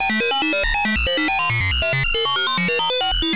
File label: pseudorandom tone